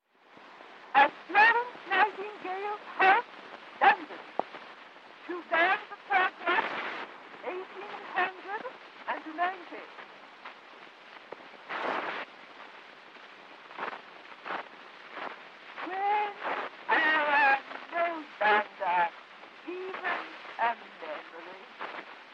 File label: nightingale